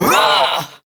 Punch, Barks; Kuva Lich; Persona C; Ability

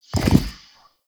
Stag Stampede Gallop; Powers

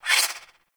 Swing, Weapons Melee; Warframe; Modular Melee

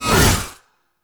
Whip Swipe; melee; weapons; Warframe; Dagath Blade And Whip

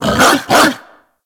Kubrow Tame Growl Idle Bark; Warframe

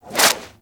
Serpent Ability Tendril Whoosh; Warframe; Warframes; Alchemist

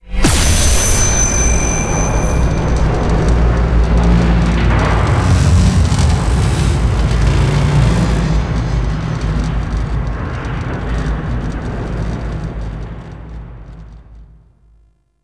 Energy Pulse; Zariman

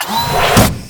Tenno Animal Foundry Sleep To Working, machines; Liset Machines

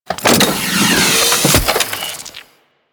Sabotage Cell Insert; Warframe; Missions

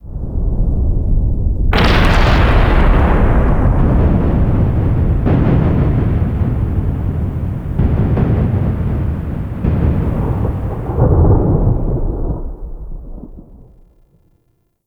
Zariman Void Storm Explosion; Ambience; Warframe; TheNewWar

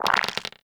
Dice Roll; Warframe; Koumei

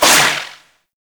Incarnon Void Projectile; Weapons; Warframe; Duviri; Incarnon Adapters

